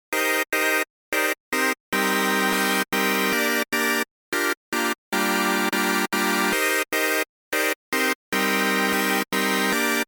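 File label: chorus normal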